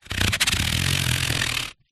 sport plastic sports cone short scrape on concrete vibrate shake; zapsplat